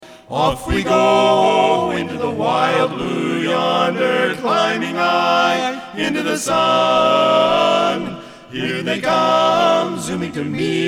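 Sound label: Military Medley AIR FORCE tenor, chorus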